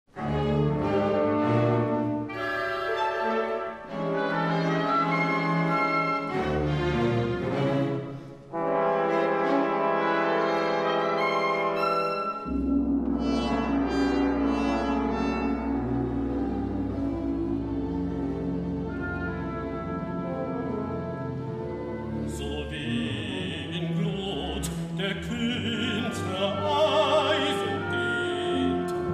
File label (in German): 5 Orchesterlieder Bariton und großes Orchester; Orchester der Immanuelskirche Wuppertal